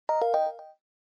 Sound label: Range Out; LG; KF900-Prada-II